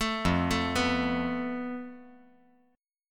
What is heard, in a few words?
F Major Flat 5th